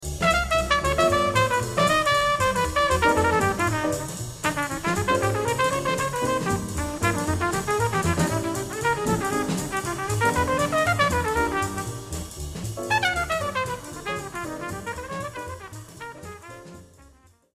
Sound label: solo; trumpet